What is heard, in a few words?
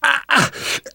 choke